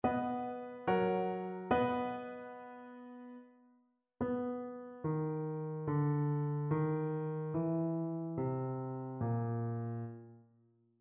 melodic fragments

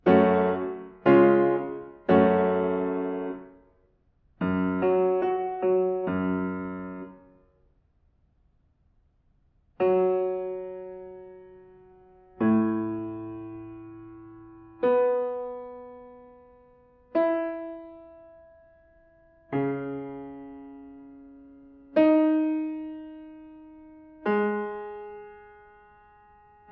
diatonic collections

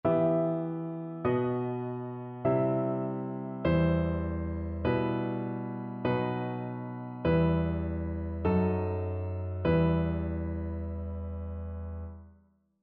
harmonic error detection

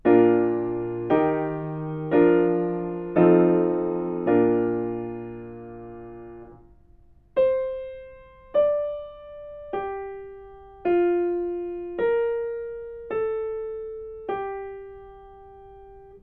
melodic fragments

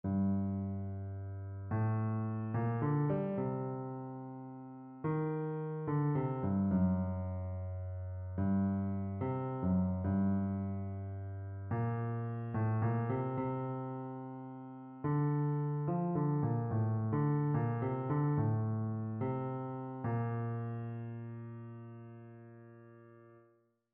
melodic error detection; ear training

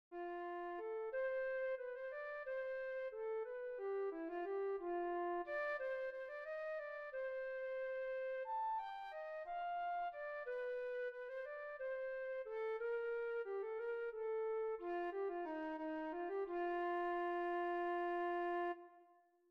melodic error detection

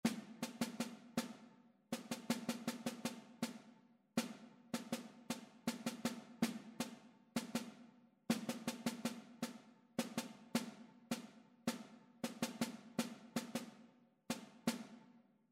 rhythmic error detection

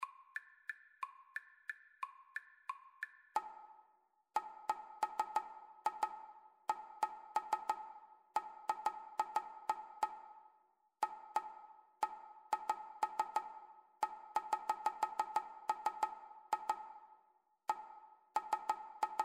rhythmic dictation; ear training

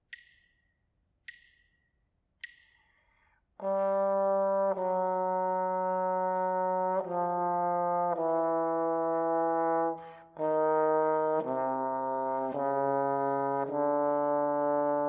partially completed melodic dictation

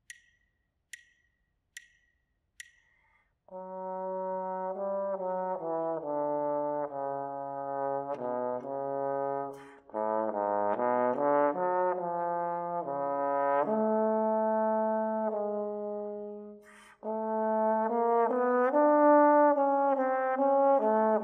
melodic dictation

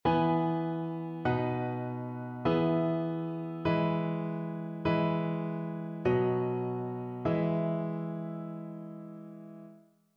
harmonic error detection; ear training

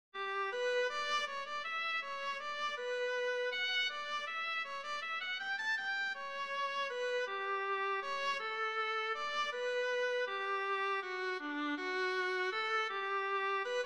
melodic error detection

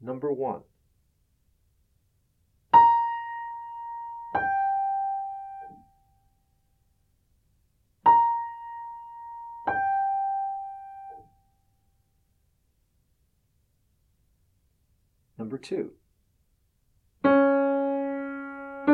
intervals; ear training

